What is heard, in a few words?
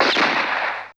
gun